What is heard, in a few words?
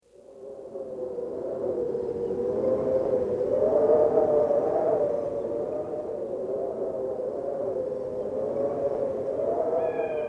Windy with Distant Bird Calls Ambience; starfox